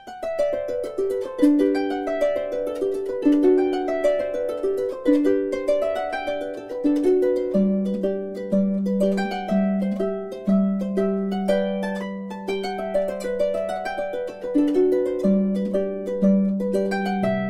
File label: Irish Melody, Harp; Garry Owen; Elizabeth Mier